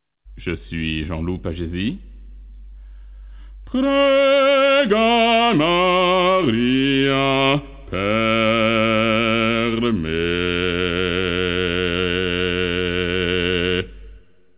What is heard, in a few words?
vocal